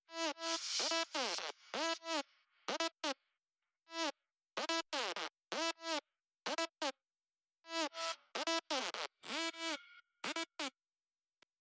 Synth

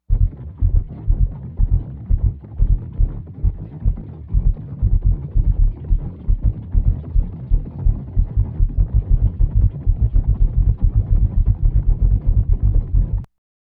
Stampede mono; Touchscreen

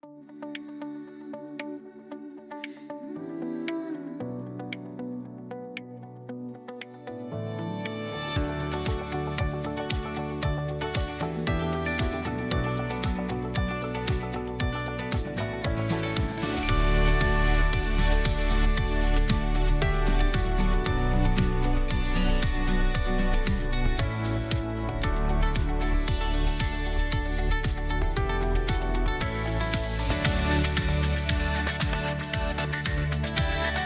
Call Center Music

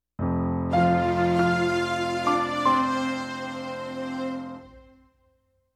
Scarlet Theme add brass

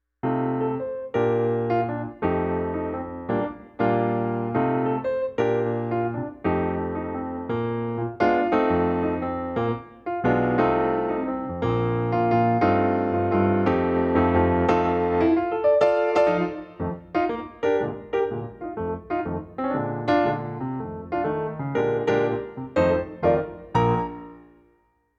Call Back Music

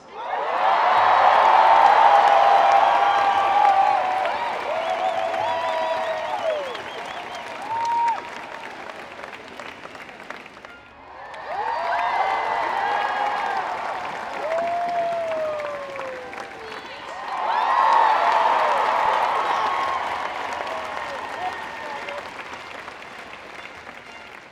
Applause

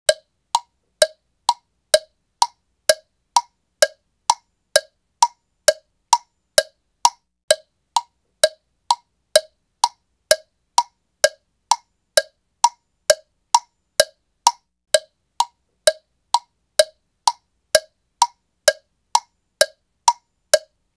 Clock ticking for 21 seconds

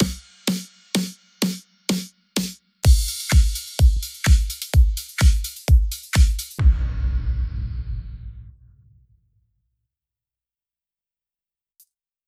Drums

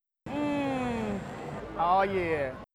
mmm oh yea; Myo Massager

